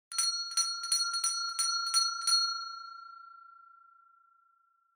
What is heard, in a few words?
Winning Bell Strong